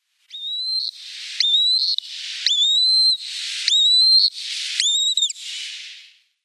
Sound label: Water Rail downy Young